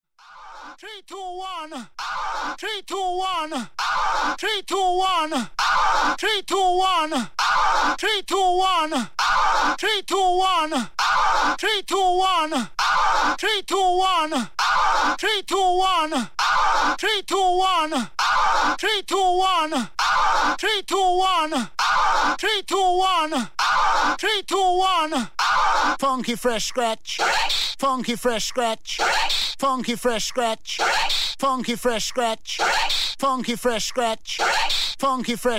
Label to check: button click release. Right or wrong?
Wrong